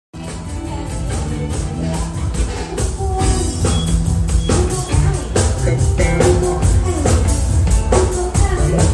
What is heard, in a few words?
concert